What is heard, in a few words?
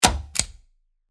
light off